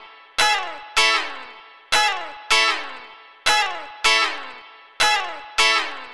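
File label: Flutes & Swingers Guitar Chicks E; Zero-G Kocktail Kollection; Construction Kitz (Lounge); Flutes & Swingers 156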